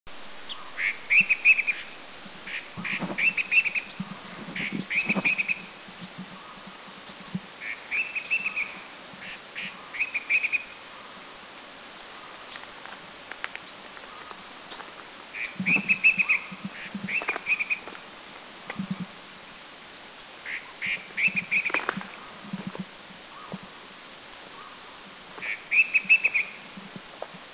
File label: Flavescent Bulbul, Thailand; Doi Inthanon